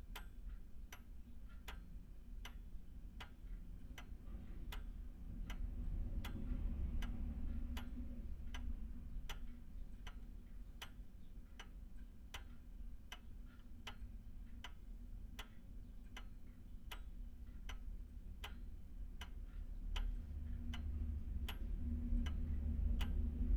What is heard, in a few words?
Antique Clock; Clocks